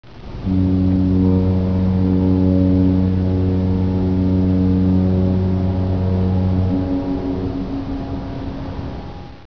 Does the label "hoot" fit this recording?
Yes